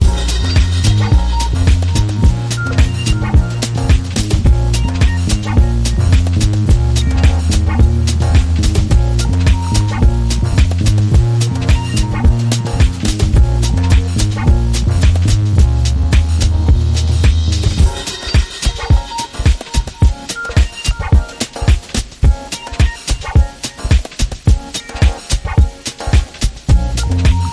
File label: Underscore